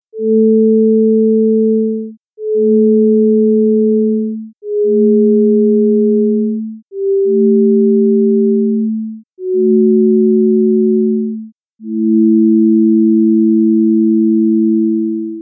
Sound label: intervals